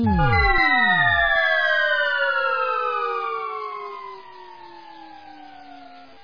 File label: falling